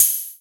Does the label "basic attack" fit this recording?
No